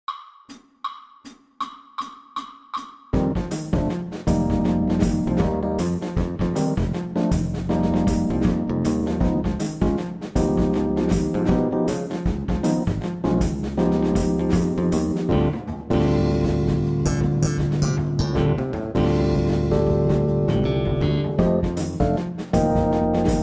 BASS Trb PLAYALONG Jazz Etude 2nd LINE; All-State; Jazz-Band

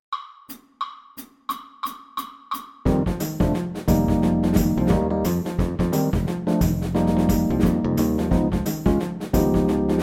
ALTO Sax PLAY ALONG 2nd LINE, Jazz; All-State; Jazz-Band

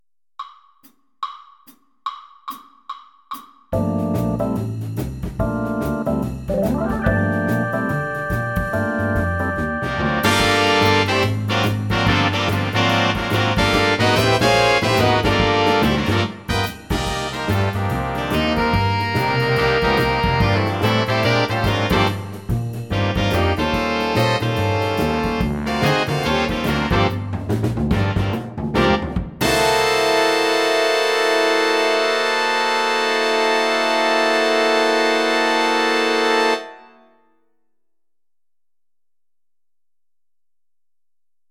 LEAD Trumpet Playalong SHUFFLE, Jazz; LEAD Trumpet Etude A-1 Shuffle Track

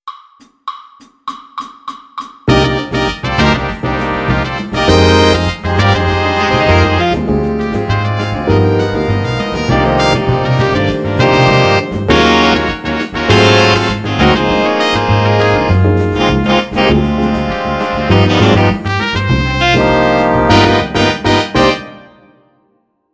Trumpet Lead Samba Play a Long, Jazz; All-State

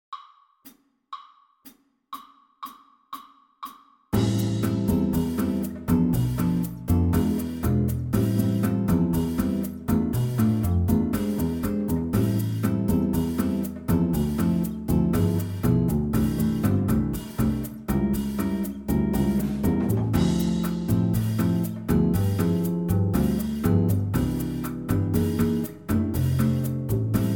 Piano PLAY ALONG BOSSA, Jazz; All-State; Jazz-Band

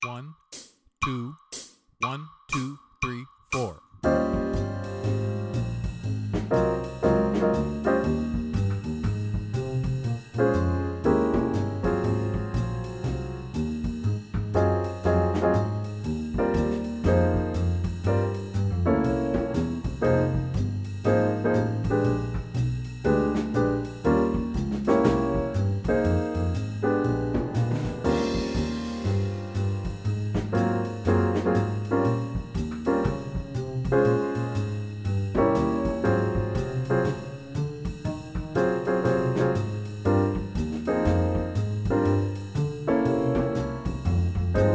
Trumpet Play a Long BLUES, Jazz; Jazz-Band; All-State

